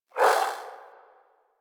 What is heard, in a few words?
Swing, Melee; Weapons; Warframe; Modular Melee